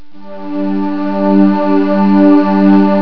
cresc pad P5th; jazz jackrabbit 2; M8

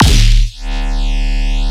tearout stab D

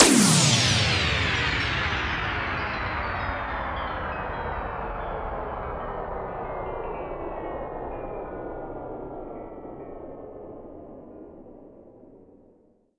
Sweeps Down

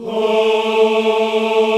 VOX African Ahs, African Aahs; Roland LCDP11 Africa VOL-1

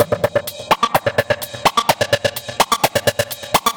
Hats, Drum Loops 127bpm; Planet Emergency; Nu Dance Electro

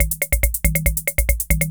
140 POPS

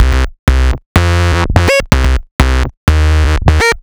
Distorted Phunk, Bass; Sonic Foundry (Sony Creative Software); Underground UK House & Electro

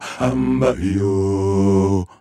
Male Voice, African Voices

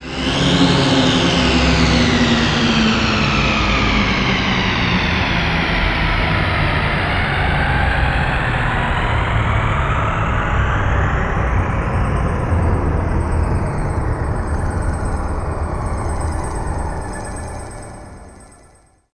SUB WAVE, Special FX Twisted

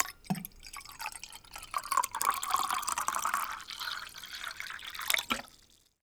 POURING, KITCHEN; AKAI S-Series CD-ROM Sound Library VOL-6

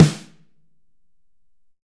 7X14, Drums; Big Fish Audio; Ross Garfield Drums VOL-2; ST LOUIS KIT

